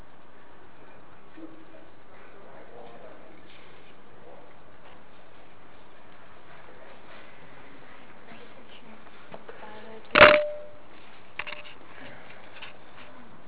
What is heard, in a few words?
playing chips